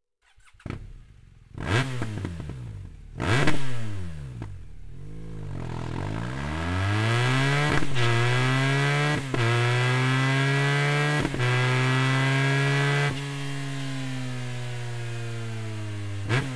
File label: slip-on, akrapovic motorcycle; b12